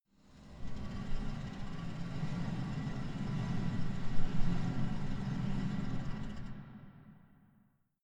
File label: engines working machines, drones